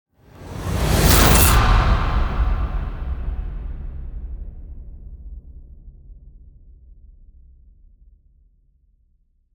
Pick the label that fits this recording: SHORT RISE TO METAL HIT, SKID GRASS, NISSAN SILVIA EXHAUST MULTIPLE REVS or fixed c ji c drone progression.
SHORT RISE TO METAL HIT